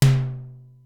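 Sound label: Simmons SDS-9 high tom, Drum Machines; Factory D4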